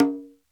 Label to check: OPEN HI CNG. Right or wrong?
Right